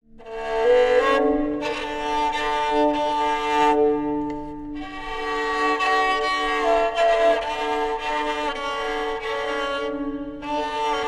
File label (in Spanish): rabel arco